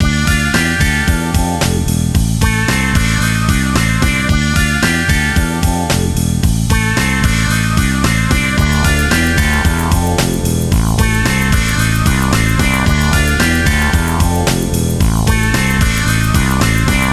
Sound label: Lounge